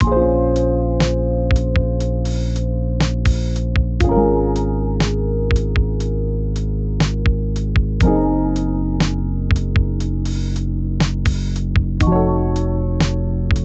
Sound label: Lo-Fi